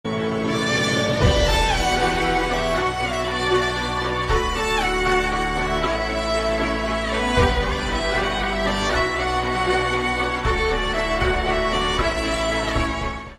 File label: Violin Ringtone